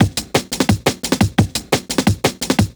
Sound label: DNB BREAK